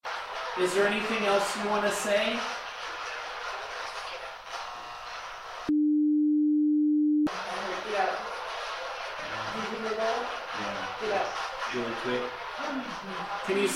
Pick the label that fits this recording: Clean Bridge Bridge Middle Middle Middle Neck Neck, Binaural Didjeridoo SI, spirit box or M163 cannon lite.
spirit box